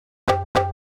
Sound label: All BPM Style Dholki Beat